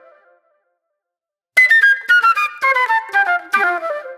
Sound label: Disco Flute